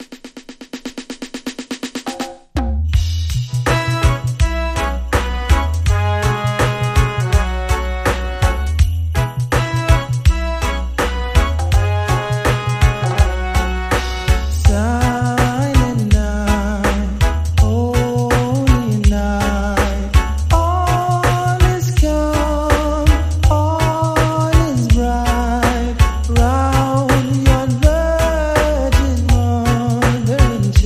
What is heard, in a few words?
REGGAE CHRISTMAS MEDLEY VOCAL